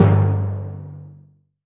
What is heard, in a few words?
timp G1, timpani; AKAI S6000 CD-ROM - Volume 5